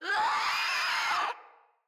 Infested Crawler Attack Short, enemies; Crawlers

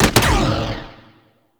Spear Gun Shot; Warframe; Corpus

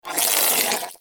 Ball Held Spark; Warframe; Missions; Tenno Ball

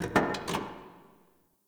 Parking Lot Car Door; Ambience; Warframe; Nineteen Ninety Nine

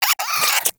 Cryptilex Vocal Attack Short; Characters; Warframe; Hunting Critters

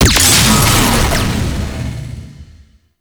Sentient Vessel Weak Point Hit; Warframe; Tau; Hit Notifications

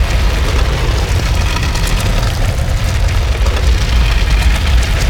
cave door open loop; Ambience; Gameplay; Warframe; The War Within

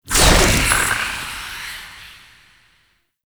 Tailor Shop Door Fade; Warframe; Ambience; Nineteen Ninety Nine; Interior

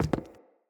Walk Wood, Footsteps; Characters; Enemy; Mockeries; Mockery

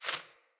Sparrow Quick Move; Animals

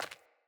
Sandals Run Default, Footsteps; Player

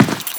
Run Default, Footsteps; Warframe; Teshin

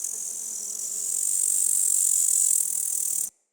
Meadow Close Grasshopper; Day Animals

